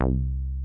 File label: TB303